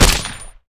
MP40; MILITARY1945

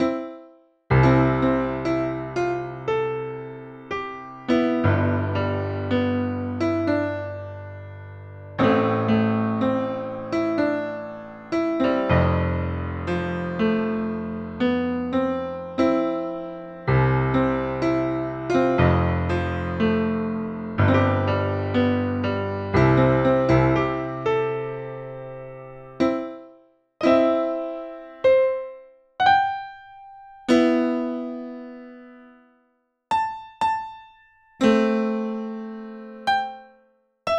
Splendid Piano; SimCity 2000

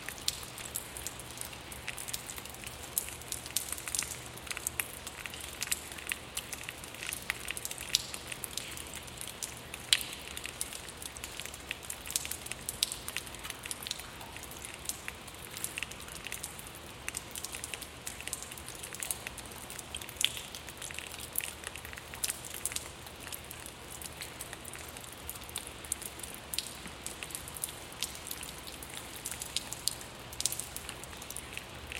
Dripping Cave; hiberworld android ios online windows gamerip 2019

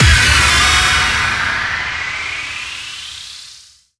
Logo jingle; Dillon's Dead-Heat Breakers; Nintendo 3DS

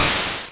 String Shot; sfx; attack moves; pokemon; gen 1; rby

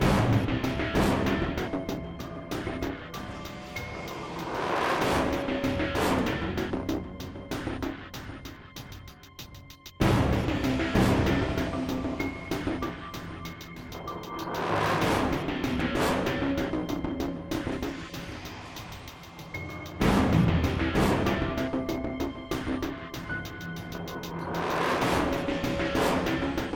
Tension

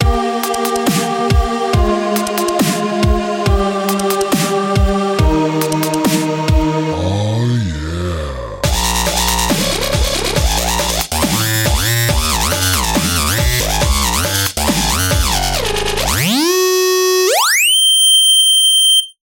WUB WUB WUB

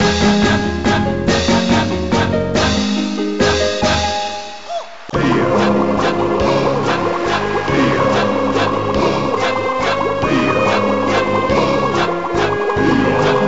Water Stage BGM; Joe Mac Returns; arcade gamerip 1994

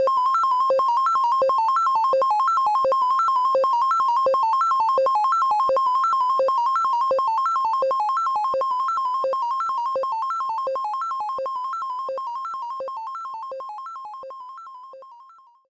In Game; Xevious; Pac-Man 99

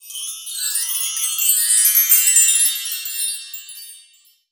magic sparkle chimes; Goddess of Trampling

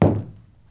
Indoor Land

